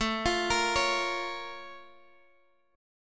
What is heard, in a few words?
Bb Minor Major 7th, A